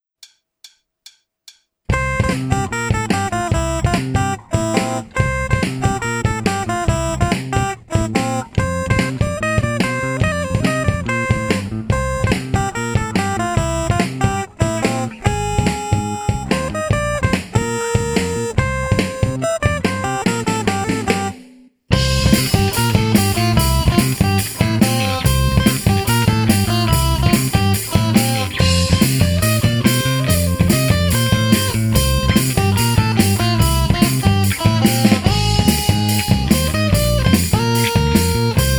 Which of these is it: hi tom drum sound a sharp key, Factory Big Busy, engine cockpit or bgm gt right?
bgm gt right